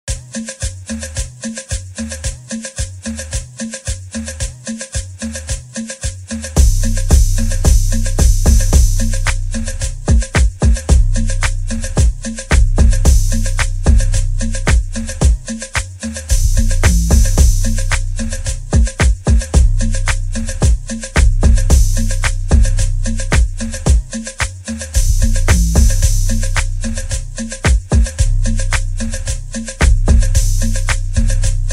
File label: INSTRUMENTAL; prod. KingMaliqq